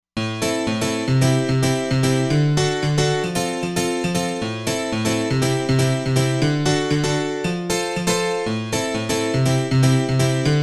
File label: Piano House Piano, synth